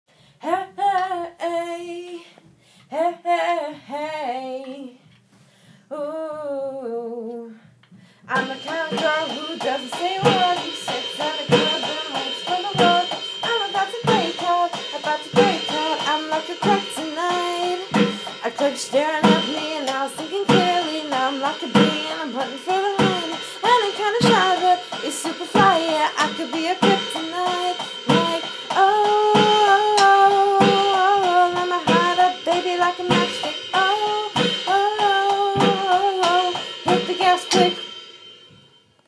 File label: drums